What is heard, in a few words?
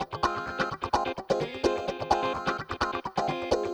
Electric Guitar - F min, 128BPM; Vengeance Samples; VEH3 Electric Guitar Kit 2